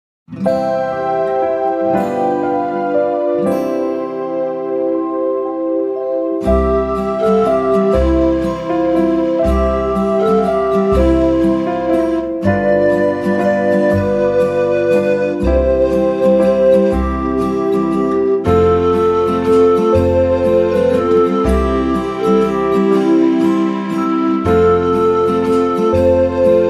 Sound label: Instrumental; Douce nuit sainte nuit; latin en chantant; Divertissement